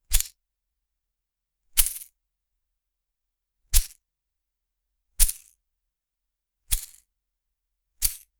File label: a fruit slice detaches